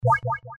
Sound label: limbo win; casino